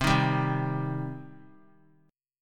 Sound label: C Minor C down; strummed; JGuitar